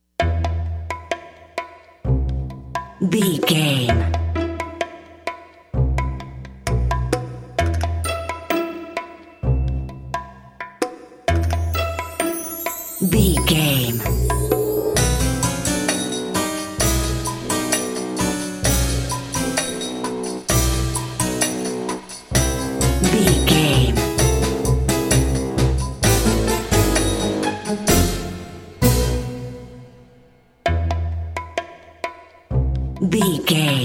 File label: unexpected complications narrative, silly, circus, goofy, comical; innocent; naive; child like; childish; Sitcom; Dramedy